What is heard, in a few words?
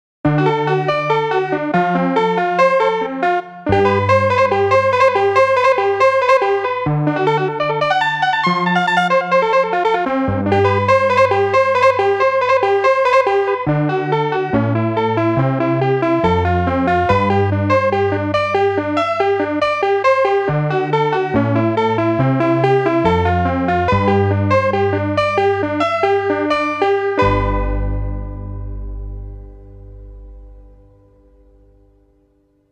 Honky Tonk Piano